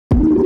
didgeridoo kick drum; music and sound fx master collection; nova sound

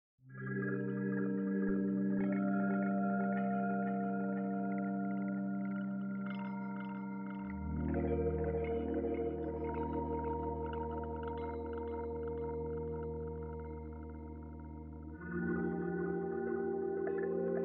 glockenmaschine warm bath; schallenberg engineering

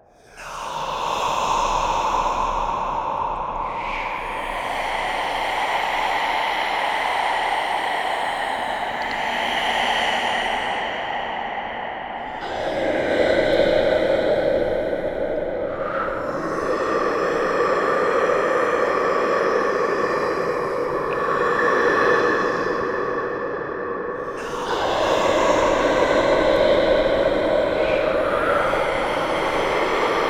szepty ghosts, dark whispers; pssst instruments

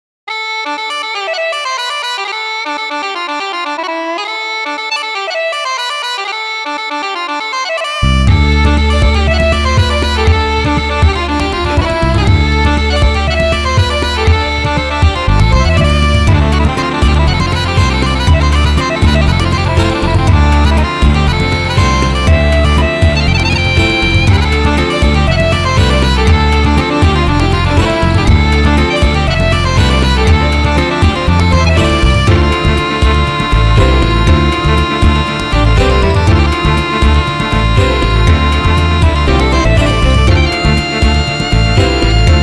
gaelic sunrise uilleann pipes; celtic pipes; Celtic Pipes V3; Logic Pro; MainStage; Bolder Sounds

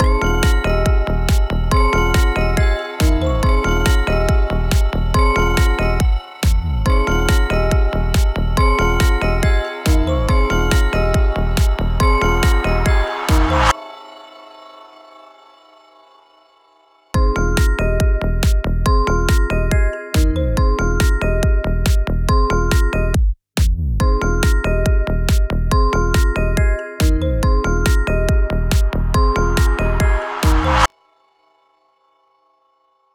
mallet; thatexture; 23dsp

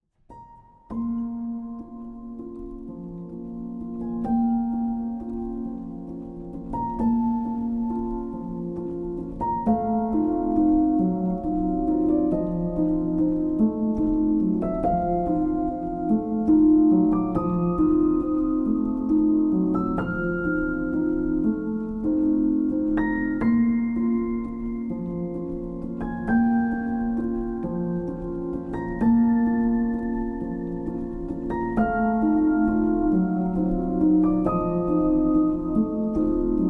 cinematic horizon, felt piano; aeval felt piano by dark fairytale instruments

